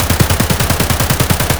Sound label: ak102 indoor close loop; Escape from Tarkov